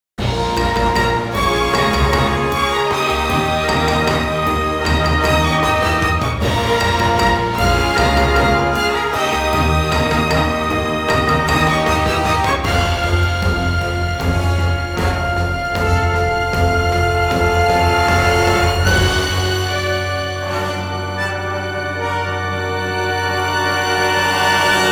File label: Groove With Intrigue Sting 154BPM 4-4; Evil Genius 2 World Domination; PS4; Xbox Series X/S